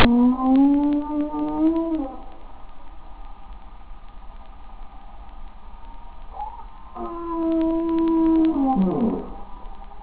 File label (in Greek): ΜΕΓΑΠΤΕΡΗ, Φάλαινα; Whale; ΗΧΟΙ ΦΑΛΑΙΝΑΣ